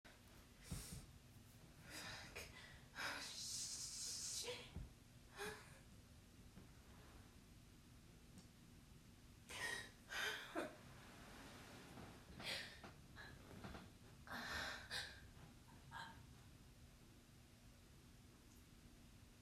Squirting, Squeal, Begging, Heavy Breathing, Wet Sounds; F4M; Gasping; Moaning; Stuttering; Slip N’ Slide On My Chest